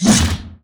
ubaide knight attack; Akeron

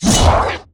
attack; hilasha; Akeron

